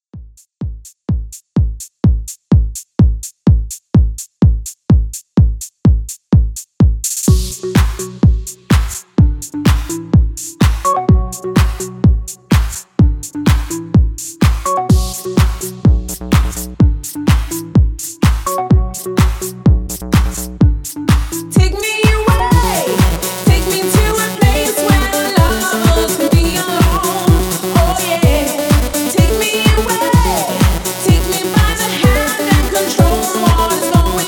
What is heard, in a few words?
Classic House 126Bpm